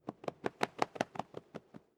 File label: bird wingflap, voegel; natur tiere